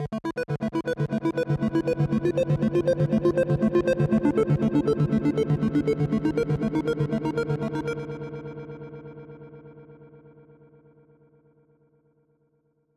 arp feedback; modular effect board; sonic instruments

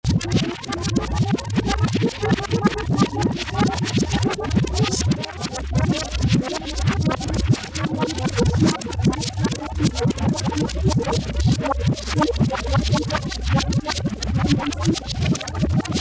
drums grainbrain; grainbrain by xnullx

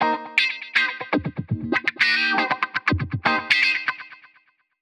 funk guitar wah; modular effect board; sonic instruments

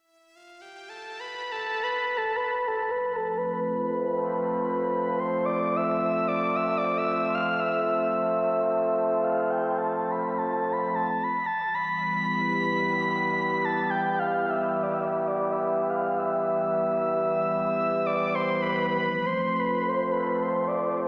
ambient; amethyst; chronicle synth; battersea audio

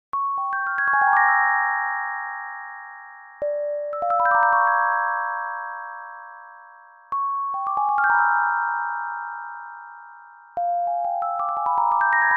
twinkle C sharp G trick; sampleson

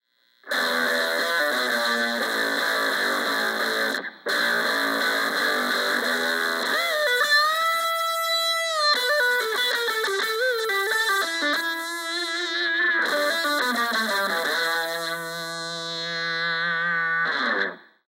medium gain, fuzz; lee cosmic level fuzz; marco dodin

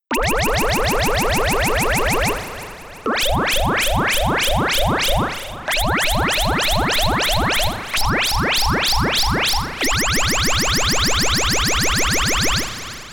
seq far signals; the transmitter soundset

